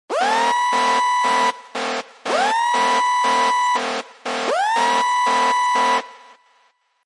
siren alarm; sigap

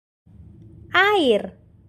air

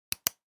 Click

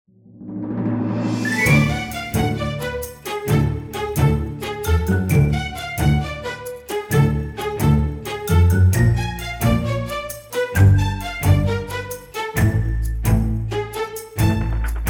Backsound Game

